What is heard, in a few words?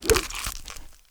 claw strike; slender; zombiebm